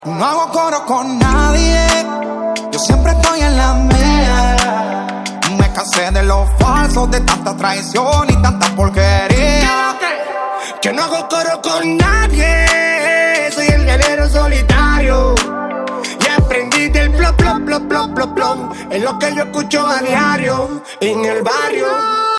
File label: remix